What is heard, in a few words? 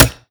Silenced Gunshot